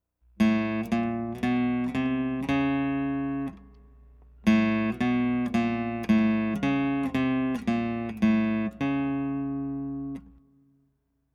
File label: Flats 5th And 6th Strings